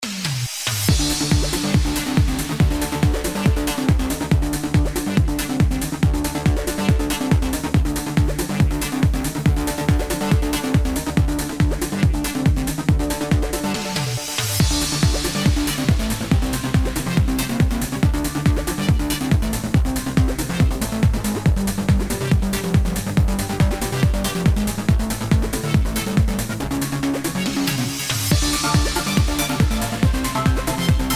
victory

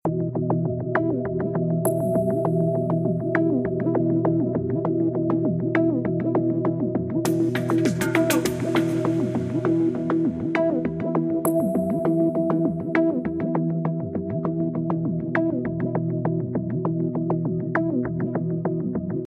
background music; LightRays; Action Games

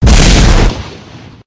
explosion sound; BoatRush; Racing Games

